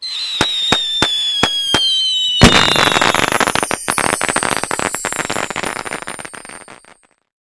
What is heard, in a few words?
fireworks